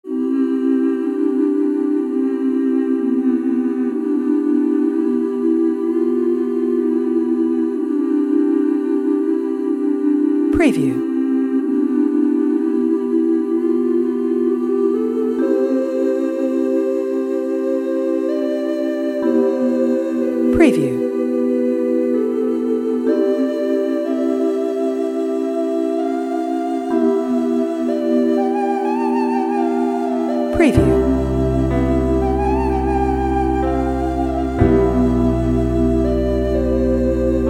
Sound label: Peaceful Spiritual Calming Relaxed Meditation Nature Ambient Music